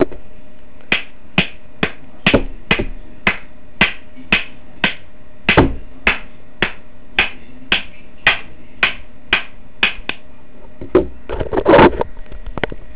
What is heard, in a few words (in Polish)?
biczowanie